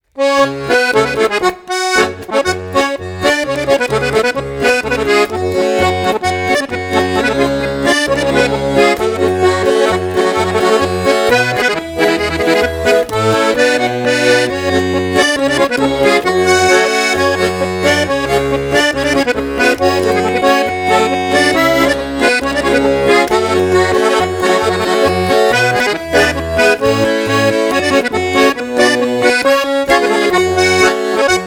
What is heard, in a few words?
polka; folklornyih traditsij zaonezhya